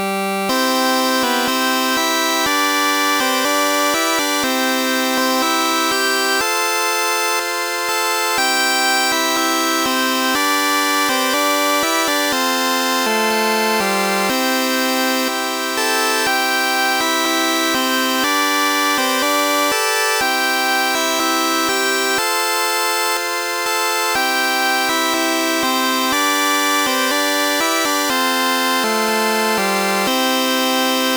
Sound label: auld lang syne akkorde; Sonix Music Driver